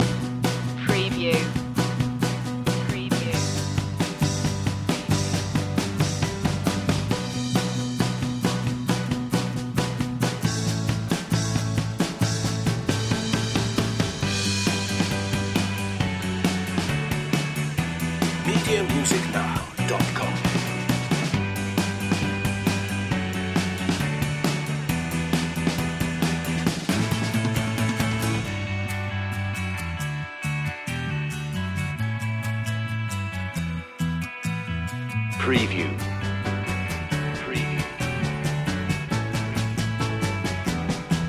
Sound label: rainbow pop loop